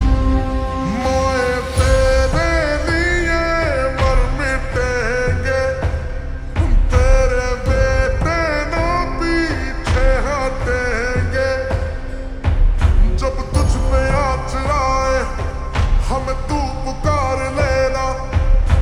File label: Ringtone Slowed Reverb